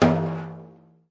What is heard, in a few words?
arabic perc, Arabic Percussion Kit; Arabic Percussion Kit 2